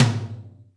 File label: Yamaha RM 50 Toms